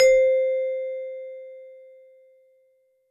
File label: vibe, Yamaha DTXpress; drum machine